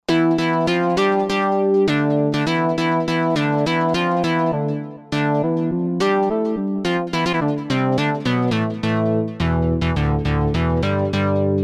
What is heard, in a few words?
Interval, Roland JV-2080